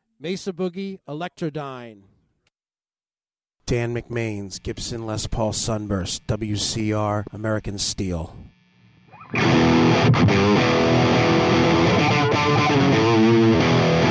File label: mesa boogie electra dyne bridge